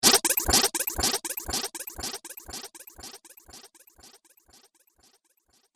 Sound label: Laser Echoes; Laser Sound; Sci-Fi Laser